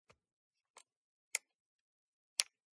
Camera Film 35mm SLR Film Rewind Knob Crank Fold Unfold; PRACTIKA DTL3; MKH8040; PMSFX